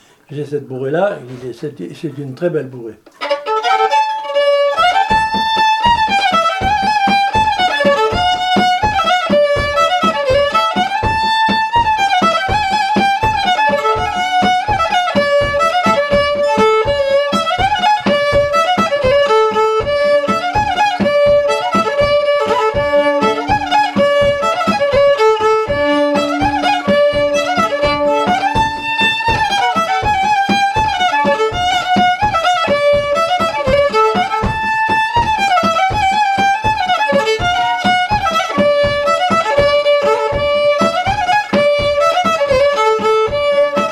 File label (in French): bourrée